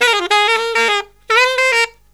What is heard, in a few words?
SAX, Killer Brass Riffs